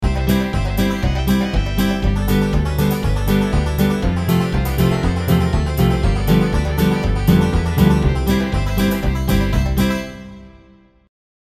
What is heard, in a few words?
honest appalachia, loops